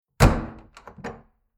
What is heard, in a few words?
Internal Wooden Door Old Heavy Closed; Miscellaneous Door; Tom Hutchings